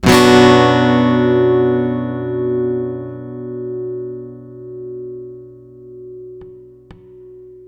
One Shot Guitar 125 BPM (B Minor), Acoustic Guitar; Instruments; One Shots; GhostHack; Ghosthack - Free Guitar And Piano Samples